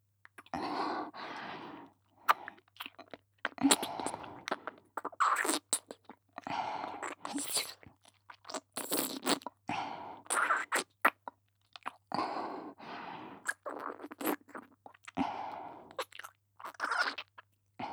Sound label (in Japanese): 耳舐め（奥まで）; 性欲旺盛な陸上部の先輩後輩JK、ベロチュー青春溺愛えっちでコーチの汚ち◯ぽ生ハメ特訓♪